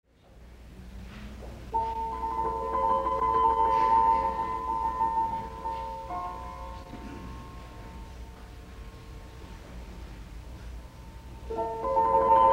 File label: Song of the Birds; Pablo Casals; Cant Dels Ocells